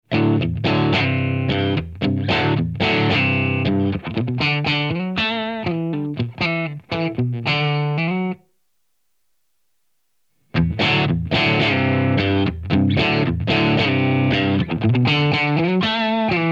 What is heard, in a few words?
Crunch; Strymon Deco → Strymon Iridium